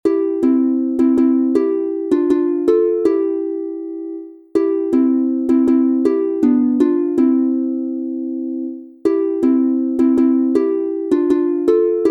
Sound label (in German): C-Dur 2 st instr, Harfe; Mia san halt die Schwirza vom Landl